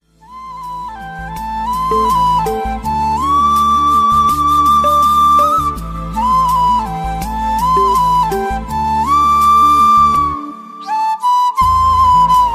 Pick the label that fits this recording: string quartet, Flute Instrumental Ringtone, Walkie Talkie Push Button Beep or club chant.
Flute Instrumental Ringtone